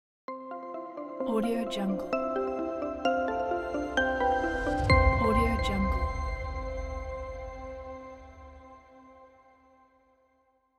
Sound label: elegant corporate logo